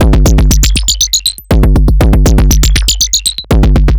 Anabeat Mix, Beats

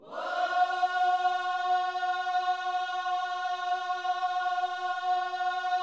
WHOA F#4, gospel; Spectrasonics Vocal Planet

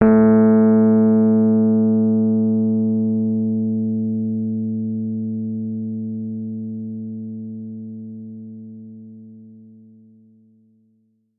Rhodes fortissimo a2; Session Instruments; Best Service ProSamples vol.42 - Session Instruments

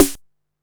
snare, techno 138 BPM; Best Service ProSamples vol.54; AKAI

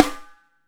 TIGHT, SNARES; Back In Time Records; Big Bang

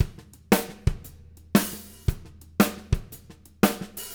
Living Drums; Sampleheads; Peter Erskine

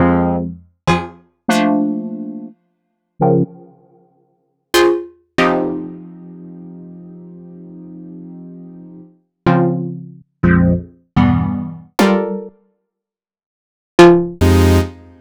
stabs

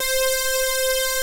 METAL KEYS; Pro-Rec - Synthsonic; Z1 DANCE

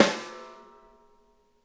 SNARE RIM; Sonic Reality; Interactive Drum Kits

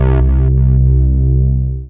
Dance Mega Synth; Best Service; 90 s Sample CDs

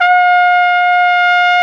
Solo Brass, Tpt p, AKG

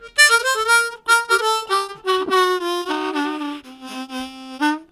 Fading, Harmonica Riffs; Zero G

